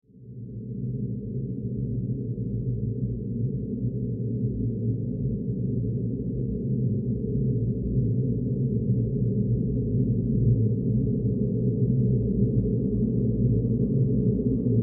Horror Drone Airy Ascend Descend; Evil

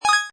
Musical, Button sound; Multimedia

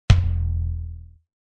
Impact Tom, Impact hit

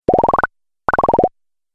Idea Bubble Pops, cartoon; Cartoon Sound Design

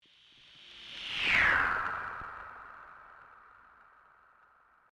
Sweep Mechanical Slow

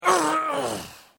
Human Death Male Dying Sigh Grunt; LYN116 package of human sound effects

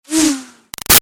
Arrow By Zip; Bow And Arrow Sound Effects; Bows and Arrows; Combat

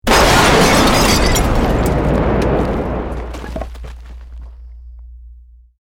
Building Collapse Medium

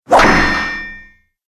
Circus Fairground Hammer Hits Bell; Hit Sound Effects

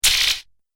Laser Alien Zap, laser gun

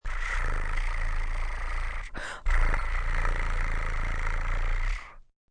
Cat Purr, Cat, purring (human voice); Animal; Cats (Sound Effects:Animals)

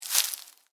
Footstep: Individual Footstep On Dry Leaves; Footsteps; Human; Stuart Duffield

